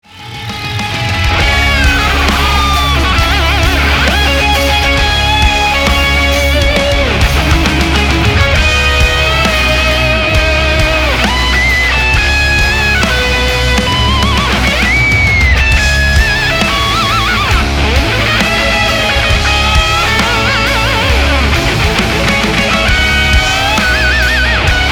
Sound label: Solo